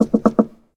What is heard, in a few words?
Door Knock